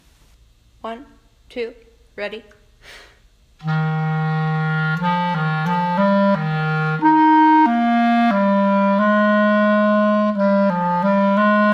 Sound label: bass clar